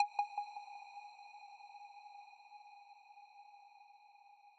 arp

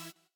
synth

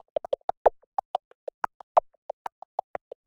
Dr Loop percus

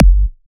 Kick

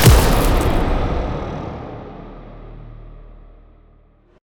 fx cinema

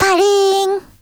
SE GAME BREAK; maimai

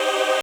choir fx

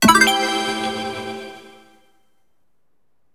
SYS CREDIT; maimai

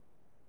SE GAME SLIDE; maimai

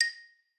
xylo

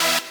lead

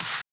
snare

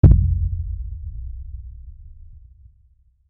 Kick

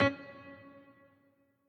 piano riff intro

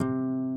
harp

